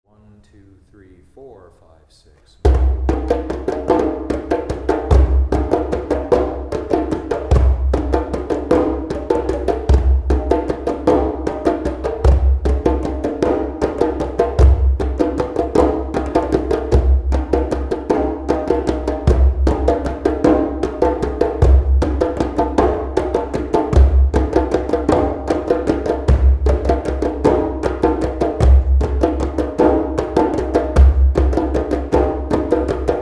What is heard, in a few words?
slow 6 8 pattern, drumming